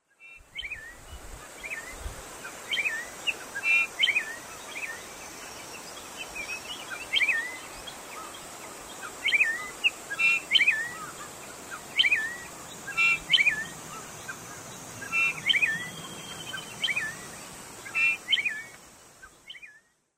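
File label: Japanese Grosbeak; ikaru; shimane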